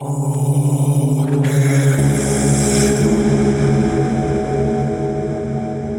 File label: Arabic Vocal Ambient Sound Chill Voice